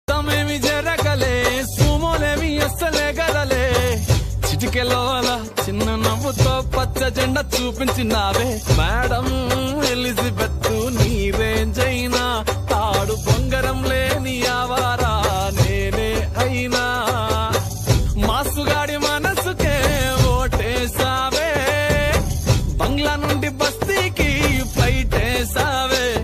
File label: love bgm ringtone; jathiratnalu movie; naveen polishetty priyadarshi rahul ramakrishn